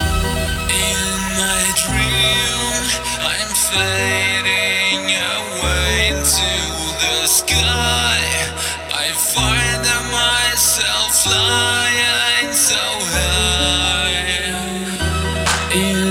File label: House Grooves; House Grooves Unleashed; Electrifying Beats